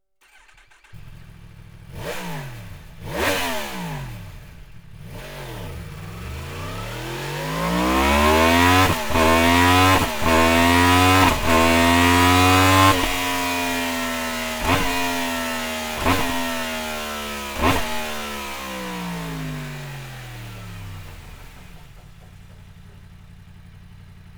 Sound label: slip-on